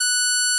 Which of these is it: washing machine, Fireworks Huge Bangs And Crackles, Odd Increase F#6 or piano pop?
Odd Increase F#6